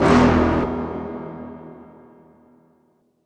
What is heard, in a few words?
Hit